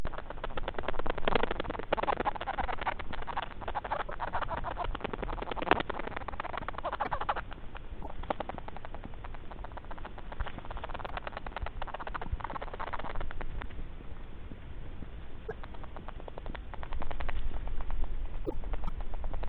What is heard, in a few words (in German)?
erkaeltete maus; nyx